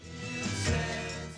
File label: menu popup